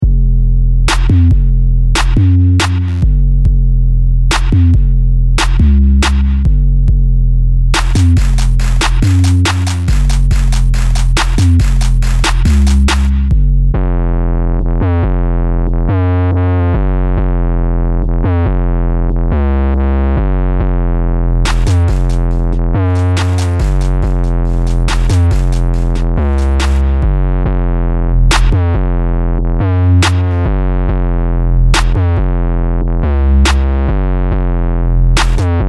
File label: trap kit; synthwarmer